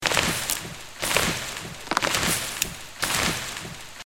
walk

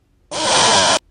auoooooooo

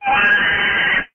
raaaaah

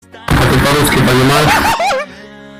russian jumpscare

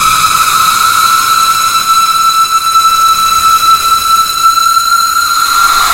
screech

